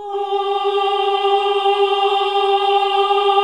OHH G#2; Best Service Gigapack 1

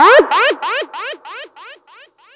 fx dub wail; M8; M8JUNGLEPAK1

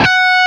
LEAD F#4; East West; Steve Stevens Guitar Samples Collection

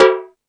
Rap Session, E-MU Pro-Cussion

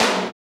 TOM TOM, Real

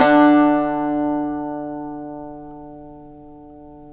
SAZ; Roland - LA Composer vol. 3

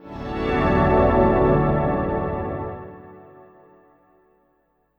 pad, drum & bass, 150 BPM; Ueberschall; Drum & Bass Resonance

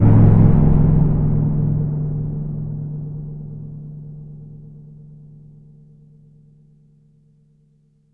EFX Fun in a Tunnel; Polyend; Plughugger

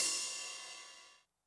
ride cymbal Low, 909; classic machines; 909mod; RC - RIDE CYMBAL

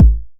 909 BD; Goldbaby Tape808 & Tape909; The Tape 909

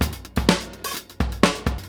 Funky 16 127, Beat Loops; Zero-G; Monster Beats

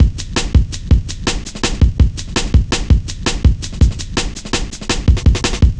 Cut Thru B, Drum Loops, Jungle, 165.5

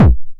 909 BD Tape Sat

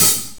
HIHAT A#5; Greytsounds VOL-1; SDX HATS+CYM

